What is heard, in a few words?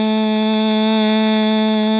hum A